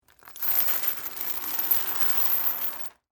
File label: Chain Drag Wood, Industry, Chain, Drag, Across, Wood, Surface; Factories; BlastWave